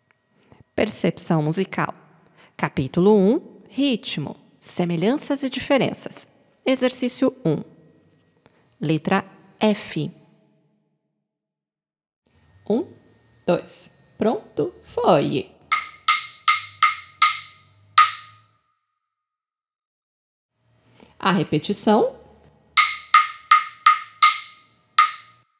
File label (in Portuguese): Ritmo Semelhancas e Diferencas; PERCEPCAO